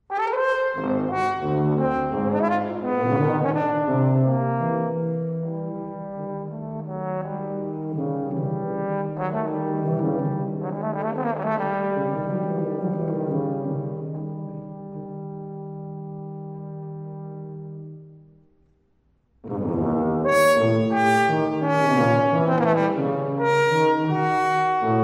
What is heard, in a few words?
Suite for Tuba and Horn - Intrada